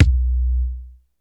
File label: roomy kick single hit b key